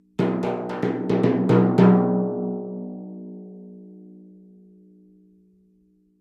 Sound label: Timpani Hands Thin, mallets timpani